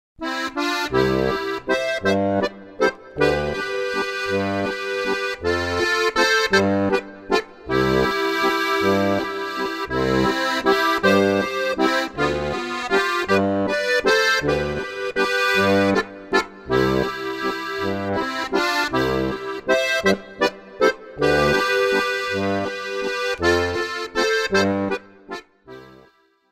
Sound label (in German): Walzer